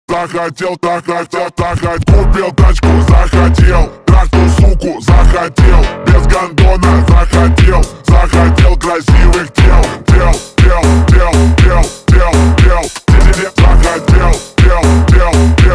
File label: Phonk Remix